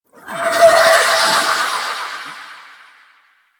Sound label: Wraith Pre Death Fly Up; Enemies; Warframe; Zariman